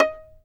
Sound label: fiddle pizz D#5; Sampleheads; Whole Lotta Country 1 & 2